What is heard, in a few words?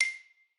xylo